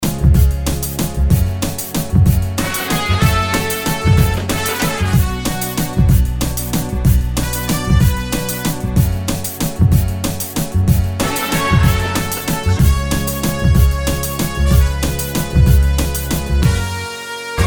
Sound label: Karaoke Song